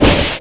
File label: Thunder